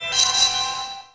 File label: Health Improved; Samurai II: Vengeance; Android